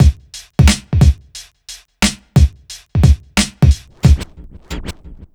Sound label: BEAT, Burning Grunge Hip Hop; HHFX 89